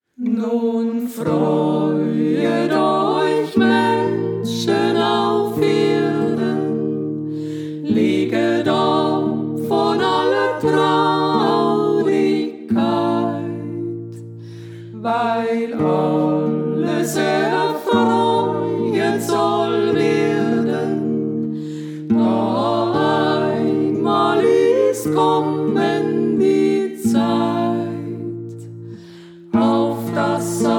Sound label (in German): Nun freuet euch Menschen, volksmusik